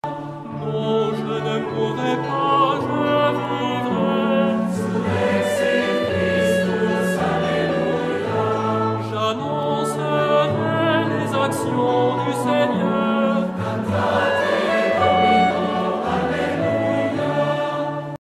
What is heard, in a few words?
surrexit christus solista